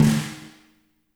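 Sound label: fat snare; Spectrasonics; Burning Grooves CD2; DRY KIT 3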